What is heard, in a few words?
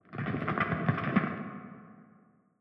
building rubble; War Ambience